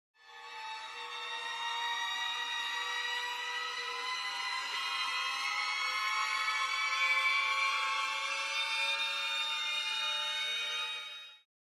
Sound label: Dark and mysterious suspenseful